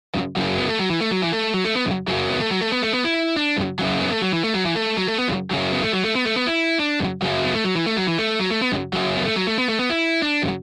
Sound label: rickenbacker